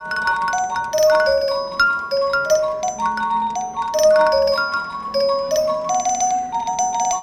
Music unusual sounds

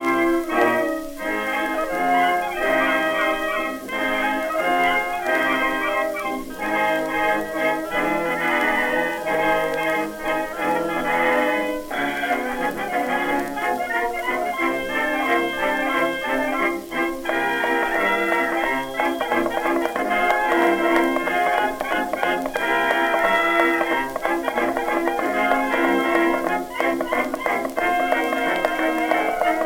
Chinese blues, jukebox blues

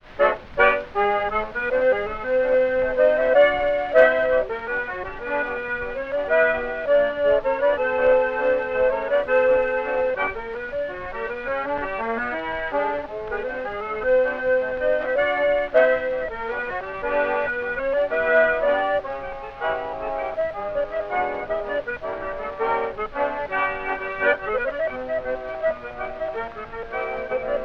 saxophone with orchestra

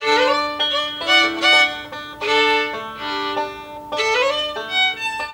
Serenade

